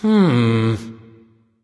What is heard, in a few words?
thinking; smite; voicepack; rama